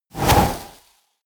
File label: whoosh